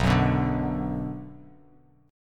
B Minor B Bass Standard; strummed; JGuitar

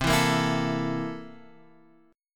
C 13th C; C13 Chord {X 3 2 3 5 5} strummed

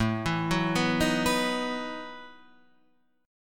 E Major 7th Suspended 2nd Suspended 4th

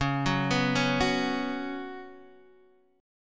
Db 7th Suspended 4th Db down Guitar Standard; arpeggiated; chord shape; JGuitar

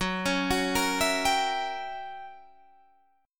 Gb 7th Flat 9th Gb down Guitar Standard; arpeggiated; JGuitar

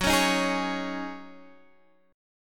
Db Suspended 2nd Suspended 4th Gb, chord

